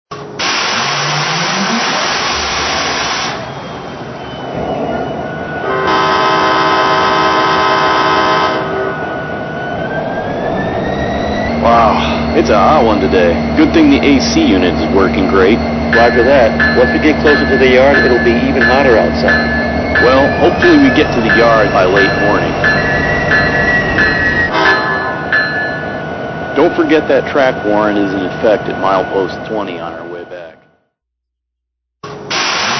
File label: EMD SD60; CSX